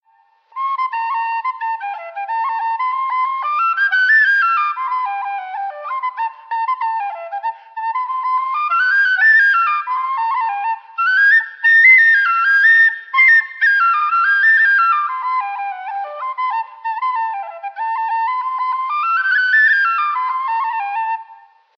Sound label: Freeman D Mellow Dog Hornpipe, whistles